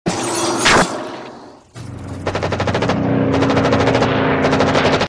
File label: MACHINE GUNS FIRING AND AIRPLANE; battle